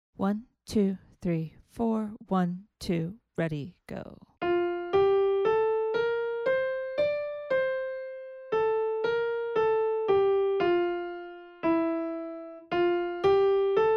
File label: melodic dictation